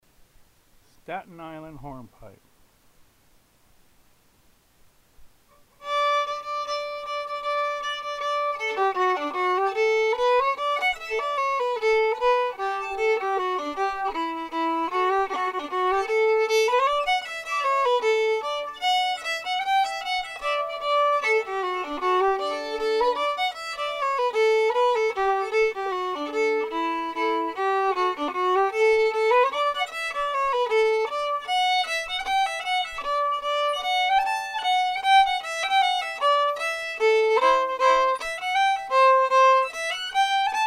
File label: Hornpipe, fiddle; festival tunes